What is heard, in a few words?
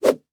Tube Plastic Tube Whooshing, Household